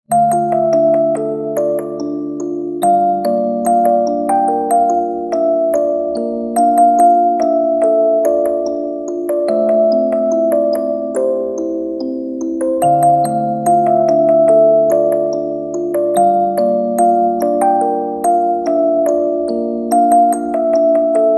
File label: ringtone